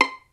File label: PITZ STRINGS